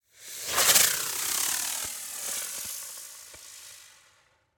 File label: firework spin helicopter spinner; sonniss gdc